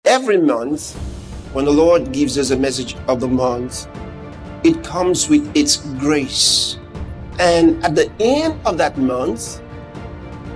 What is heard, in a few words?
JINGLE